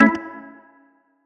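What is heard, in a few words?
organ stab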